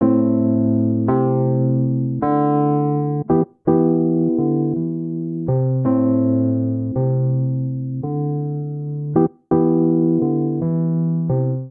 Progression Indie Soul i - v - iv - VII A Minor 82 bpm, Electric Keyboards; Ableton; Factory Packs